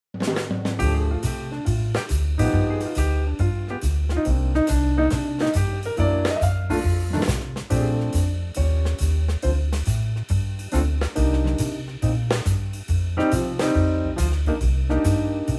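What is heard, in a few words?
B flat swing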